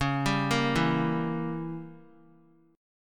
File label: Db Diminished 7th Db down Guitar; arpeggiated; 4E,4A,5D,5G,5C,6F